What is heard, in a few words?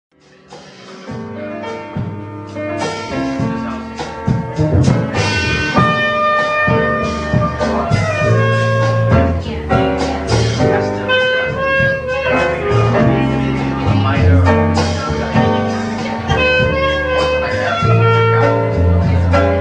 jazz duo trio quartet; kansas city